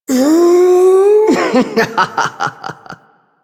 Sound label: Lift Laugh, Corpus Lich; Warframe; Persona C